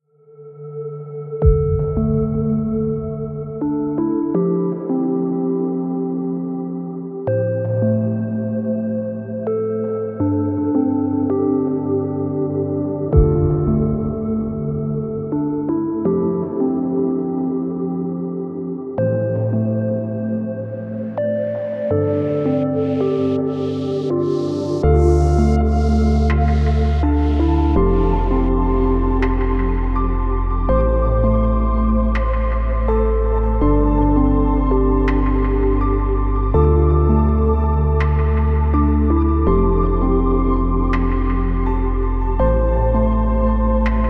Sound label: touching ambient